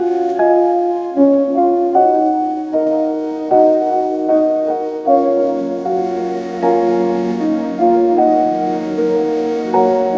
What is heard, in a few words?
elegant strings and lofi piano, ringtones